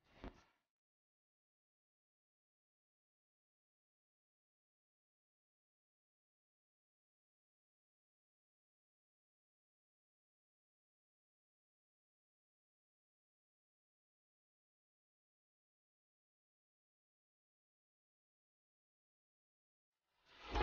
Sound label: english wave music mobile ringtone song